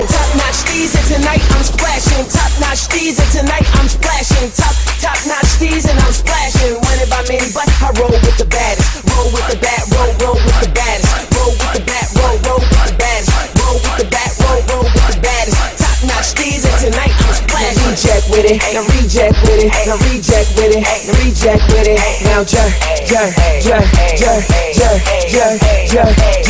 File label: dj scratching; hip hop